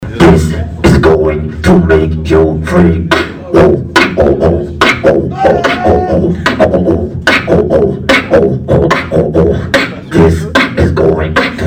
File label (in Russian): битбокс